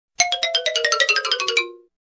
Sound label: Funny xylophone cartoon falling sound effect